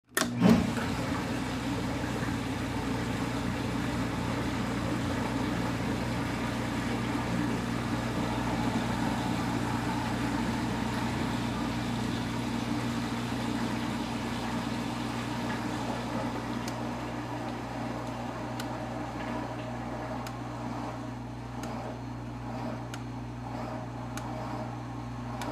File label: dishwasher, machine sound effects